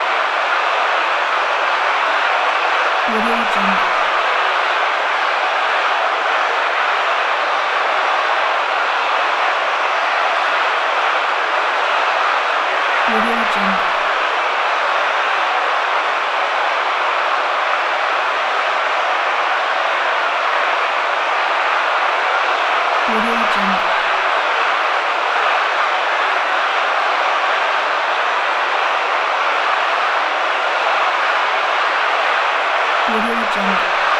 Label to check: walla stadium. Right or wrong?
Right